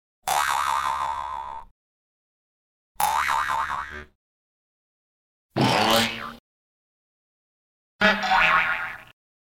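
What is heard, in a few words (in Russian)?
смешная мультяшная бомба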